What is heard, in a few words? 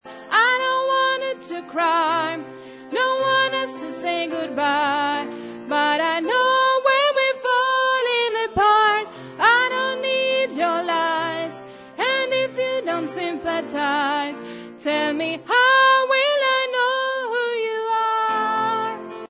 tenor